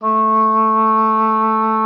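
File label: A3, oboe d'amore; Xsample VOL-7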